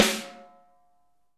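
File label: snare ambient; Best Service; Real Mega Drums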